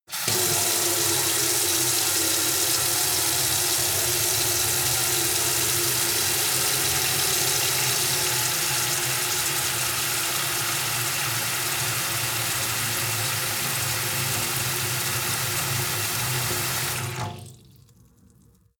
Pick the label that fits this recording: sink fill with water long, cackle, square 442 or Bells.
sink fill with water long